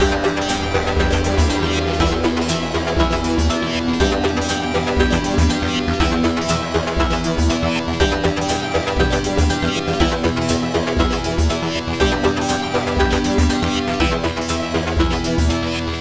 Lab Music; Horizon

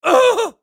Damage Male; gamerip; Car Simulator 2; android

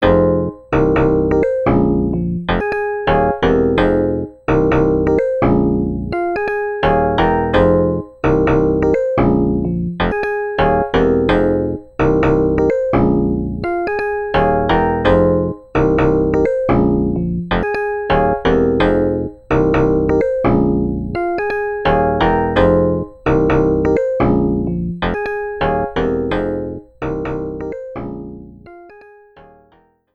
FM Piano Test; SDI; Quartet; System 16 Collection

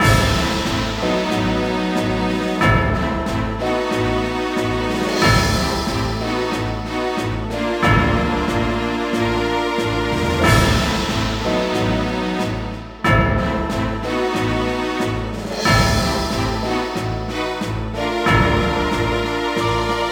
Lobby; Cookie Run OvenBreak; Storyrun Lod Master

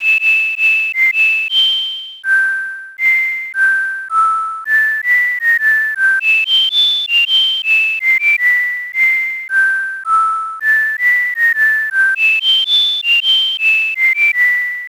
Whistling in the Shower; super mario rpg 1996 snes

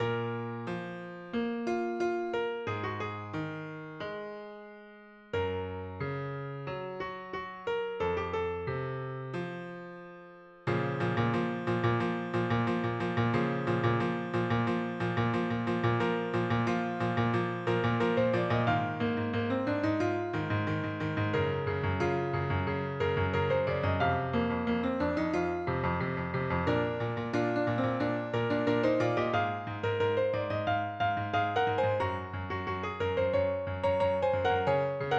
Piano intro; Splendid Piano

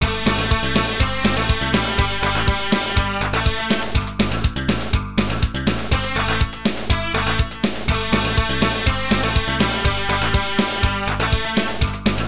Loading BGM; Xadlak; X68000

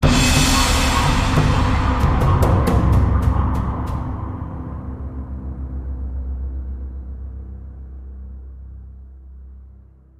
Combat Heavy Outro; Darksiders

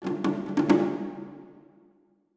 Music Battle

